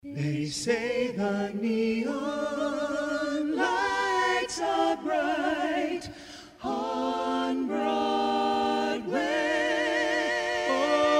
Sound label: radio music bed